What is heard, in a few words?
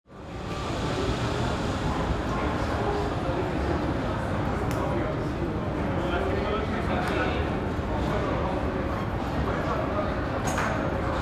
airport hall